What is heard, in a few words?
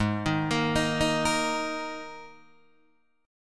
G sharp Suspended 2nd, G sharp, down, Guitar, DADGAD